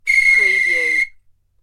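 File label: Referee Whistle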